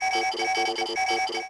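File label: transmission loop; electrical sparks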